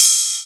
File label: Ride, Cymbals